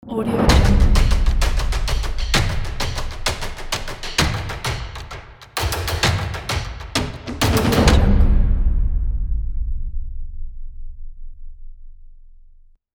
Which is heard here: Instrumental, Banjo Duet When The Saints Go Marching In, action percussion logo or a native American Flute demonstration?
action percussion logo